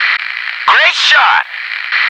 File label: noice